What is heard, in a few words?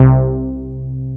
MOOG; OLD SYNTHS; Rubber Chicken Software Sample Bank